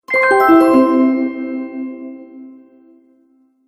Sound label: Sparkle Gliss Ending, magic sound effects